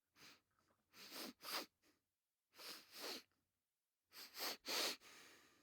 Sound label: male sniffles